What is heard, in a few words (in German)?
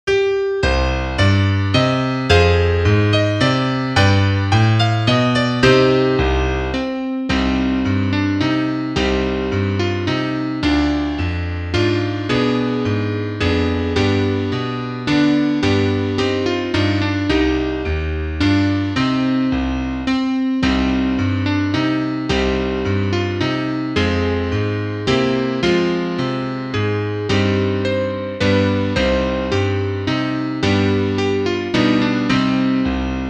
Klavier Solo, trompete; Zweierlei Seite 71